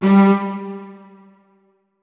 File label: notes, keyboard; Hideout Furniture